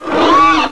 Place Speed; Chiral 1.0.1

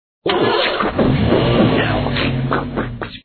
start; super racer

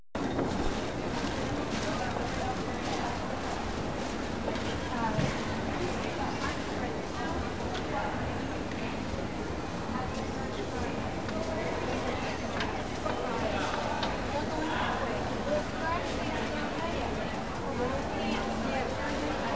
MALL

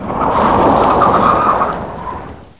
SPIN ROC

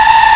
skid on; rashdemo

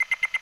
battery low; soundscheme for windows

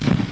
hoof; Little Red Riding Hood

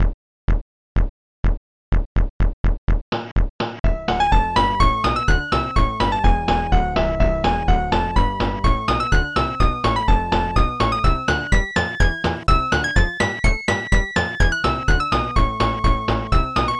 bonus song; jackman

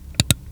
CLICK

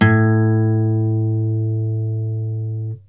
A STR, GUITAR TUNNING

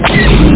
laser fire

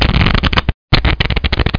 Shuffle; Cyberstrip Blackjack

